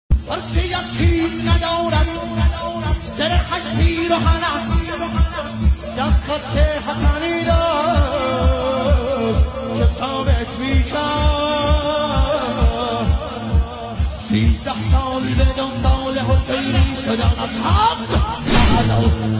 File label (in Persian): مداحی